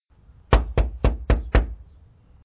door knock

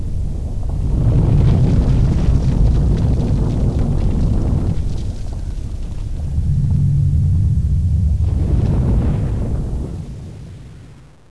unstable ground

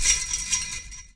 fence

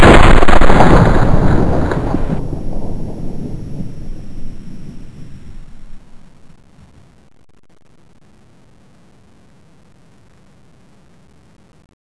m4 fire

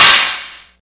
hit; greyfox

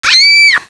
fright hard; infection

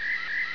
critter

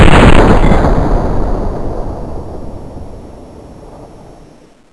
fire, m16; weapons

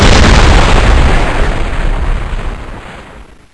explode slow

